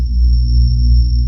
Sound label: Shield Generator Loop